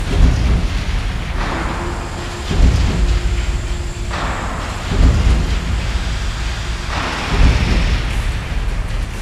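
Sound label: outdoor loop; Garry's Mod; tf2; cp harbor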